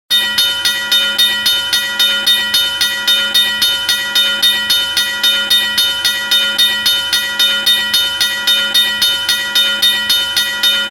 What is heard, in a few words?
fire bell sound